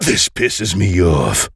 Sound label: Pain; Duke Nukem; Forever 2001